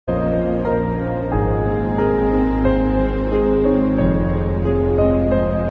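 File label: korean background music ringtone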